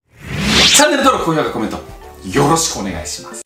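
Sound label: power off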